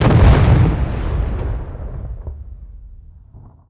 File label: 100ton exp back; Call of Duty Modern Warfare